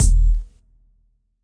noise 808 kick; Hip Hop Specialty Kit; STYLE KITS (Hip Hop, Etc)

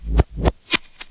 107 whup whup back, drum loops and breaks; 101 to 110 bpm

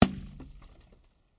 snowball glass, Throwing Snowballs; Throwing Snowballs Kit

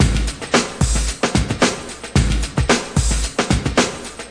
eighties breaker, 112 bpm, drum loops and breaks

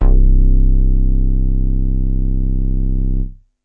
simple low, Basses

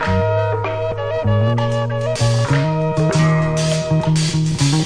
flutey ethno quartet; SAMPLED MUSIC LOOPS

